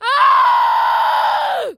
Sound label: Screams Shouts Humans Child Scream